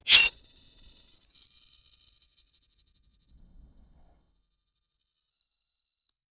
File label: sound of a lightly armour; wince